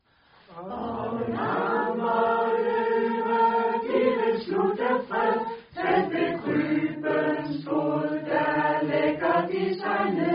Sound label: acapella